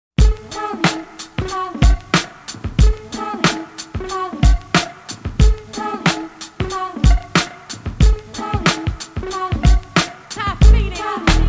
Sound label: polyrhythm break glass